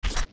steps grass land; Jumping and landing on Grass (young); zelda; OOT

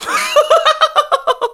Laugh; Zelda

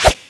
Boomerang Throw; Throwing the Gale Boomerang; Link

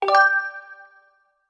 Prompt Select; Select an option in a prompt; Skyward Sword; zelda

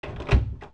Door Regular Close; zelda; OOT

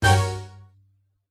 Battle Strike

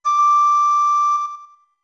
Flute D2 long; OOT

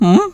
Hmm; Match Master (and Doppel Master): "Hmm?"

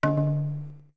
Drums D2 long

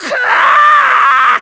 Yell; Orca

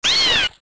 hit; Tektite

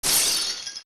Icicle Shatter

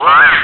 Burn; Link getting burned

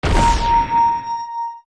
Arrow Hit Ice; Magic arrows (Fire, Ice, Light) hitting something

